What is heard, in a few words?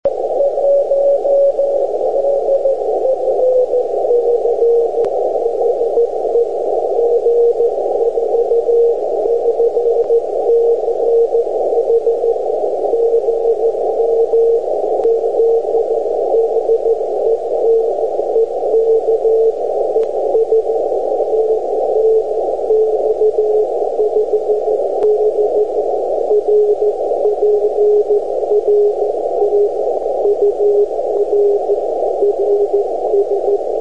CW QSO; G4CCH